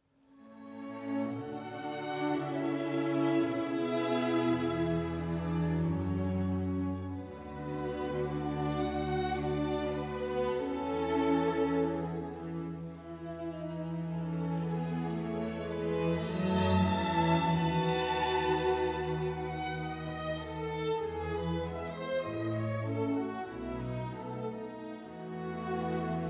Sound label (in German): doppelkonzert d moll adagio; mendelssohn bartholdy